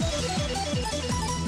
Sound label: Ding